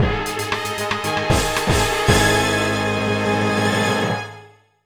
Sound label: Horror Win; Intermissions + Nanogames; SpongeBob Squigglepants; Wii